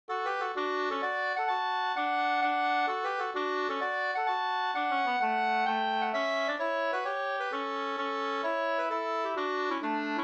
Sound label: mazurka